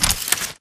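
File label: Tick Movement Footstep Jump Land; Titanfall 2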